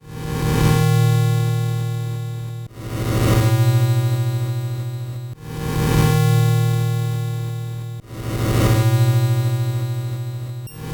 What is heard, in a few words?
8bit bgm normal